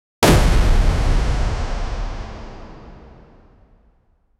a powerful impact sound followed by debris scattering; explosion